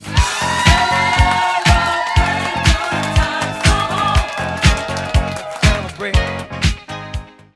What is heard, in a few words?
celebrate